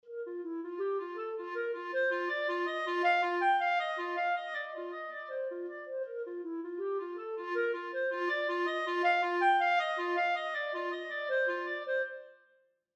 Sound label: Clarinet; examples in treble clef